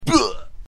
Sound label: human pain; csgo